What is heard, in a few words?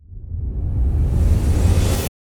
Reverse Orc Riser